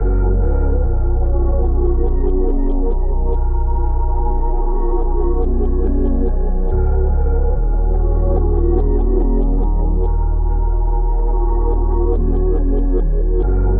143 BPM D# Min, Melodies, Reverse; Caverns

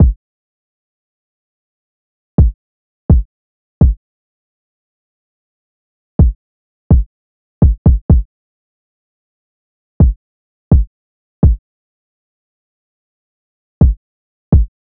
Drum Loop 126 BPM Kick; Drum Loops; Loop Stems; Hip Hop; Cymatics; Casa Drum Loop

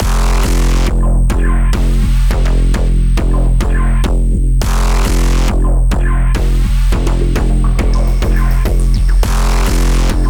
104 BPM F Min, Melody Loops; Cyber Collection; Edge Runner

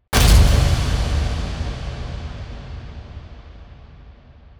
Impact; Hits; Nubz

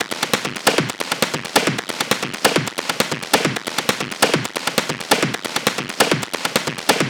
Perc Loop 135, Techno

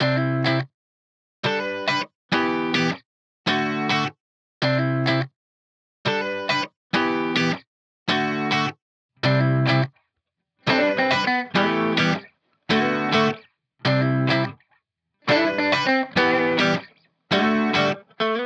104 BPM E Min, Guitar; Autumn; Cymatics; Miami Guitar Pack - Pt 1

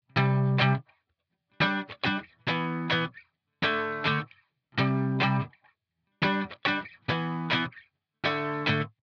104 BPM E Min Power Chords, guitar; Cymatics; Miami Guitar Pack - Pt 1; Autumn

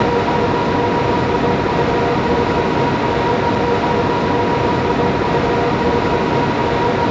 Texture Loop, Techno

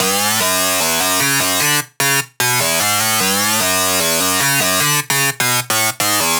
Lead Loop F# Slider, 150bpm; Dubstep; Ghosthack; Dubstep Sound Arsenal

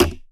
Percussion, Hip Hop, Drum One Shots; Cymatics; Cobra Hip Hop Sample Pack

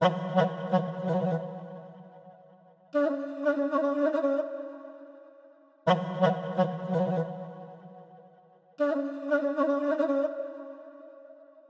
Flute, 164 BPM F# Min; Loop Stems; Cymatics; Cymatics Gems Vol 12 - Duel

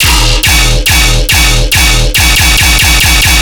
Bass Loop D# 140BPM, Dubstep

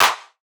Clap, Techno; Techno Sounds 2024

